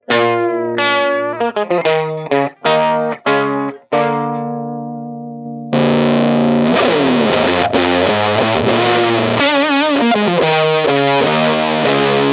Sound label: Fuzz Face Mini; FFM1